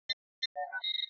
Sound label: scr display, computer; romulan